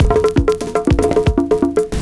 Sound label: PERCS, 70 s Breakbeats